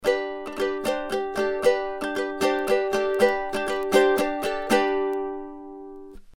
Sontronics STC-3x Niere Ukulele

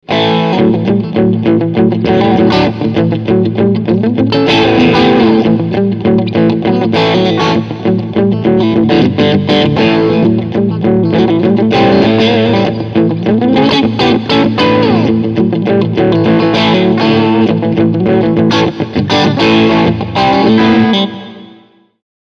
Steg Mitte S-1 OFF

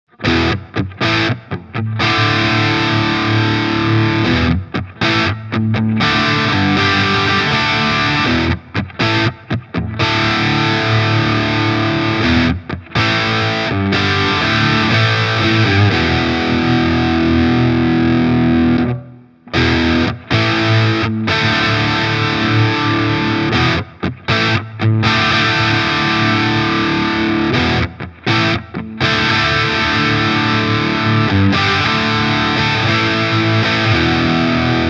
Steg crunch; Yamaha Revstar RSE20

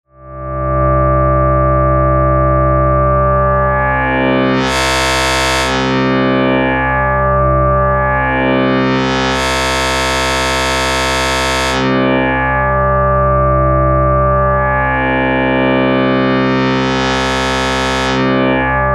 Einige Wavetables Morphing von Hand

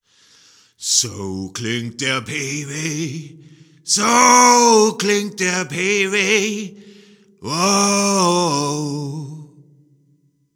Hall tief

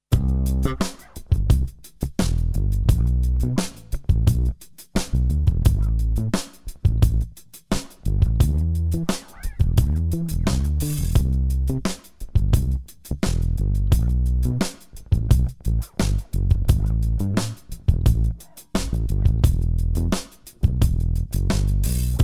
Super Extrem

